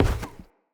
Step Wood, Footsteps; Enemy; Corrupted Sproutfolk

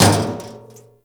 Physics Barrel Impact Medium, Metal Barrel

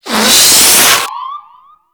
Balloon Inflate; Festivities; Warframe; Follie

